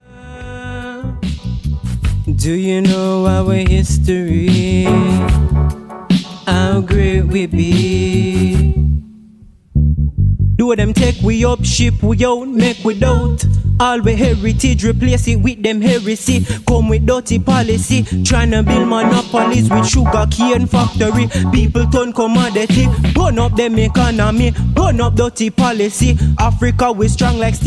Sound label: African Khozi